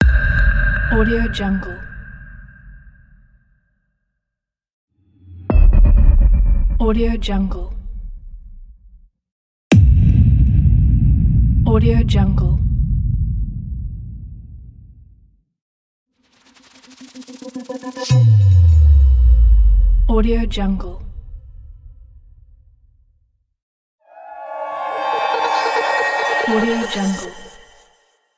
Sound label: tense trailer transitions; akkord system